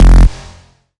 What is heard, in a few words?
Bass F, Bass Synth